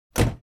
Car door close exterior

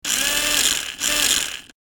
Remote controlled sport car road movement; rc toys sound effects

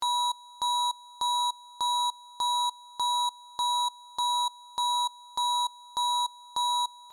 Activation sequence alarm loopable

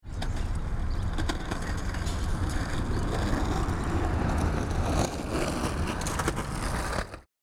Skate park ambience

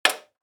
Electric fan plastic button switch on; appliances

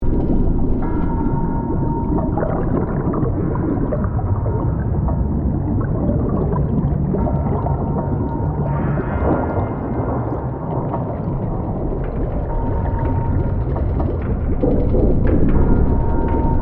Abandoned shipwreck ambience underwater loop

